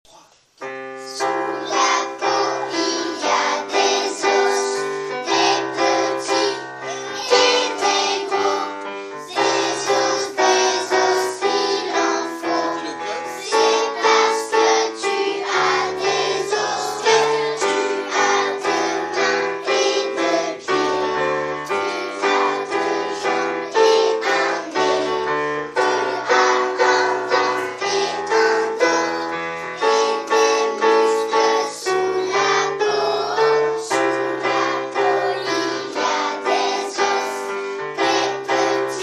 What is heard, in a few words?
Vocal